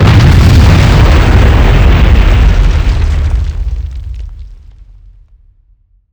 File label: Explosions Explode Ground; DCS